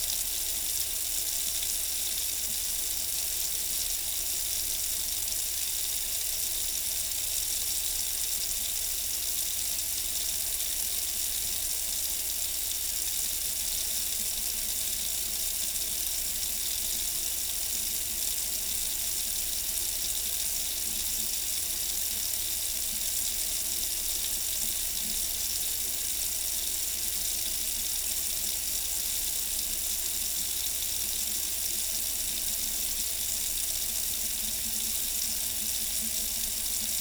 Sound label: Kitchen Sink Water Running, Interfering noise; TS 103 504; ETSI